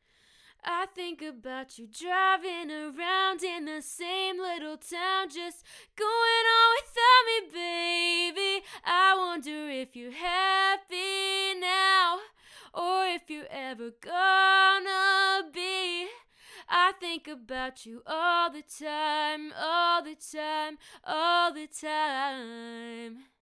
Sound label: Neat King Bee II singing microphone sample